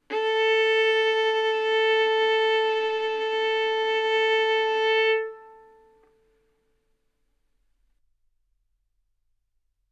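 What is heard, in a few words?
Violin arco mf A string A4 B4 stereo; sul A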